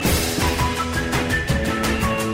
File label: Wistle Terriers